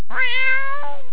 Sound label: meow